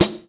Snare Jungle

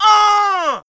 Vocal "Awhh", exclamation

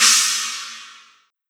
Cymbal China Short Death Metal; pitchglitch

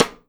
Snare Side Stick

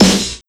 Snare Hard Short Oldy

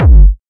Kick Dist Techno; Teacoma

